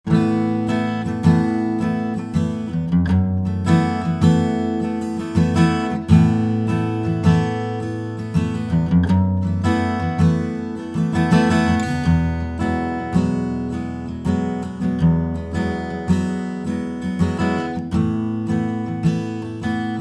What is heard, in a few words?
Gibson pick